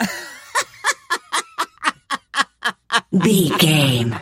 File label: voice male laugh high long